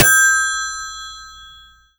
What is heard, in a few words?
bell, telephone rotary; physics; props